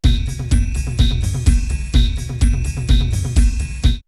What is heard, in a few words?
R8 Tom Tom, drumloops, DrumMachines; X-Static Goldmine; 90 s Sample CDs